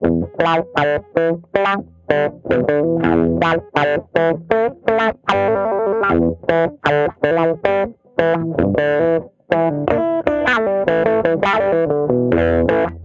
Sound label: wah